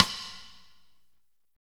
SNR, Roland; Northstar - Drumscapes Roland

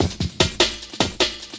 LOOP, 150 BPM, Drum & Bass

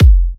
Kicks F; markus hakala

